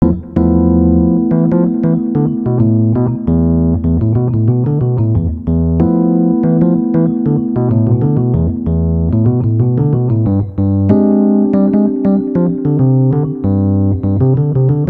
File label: Keys